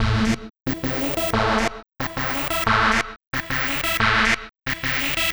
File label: Techno Synth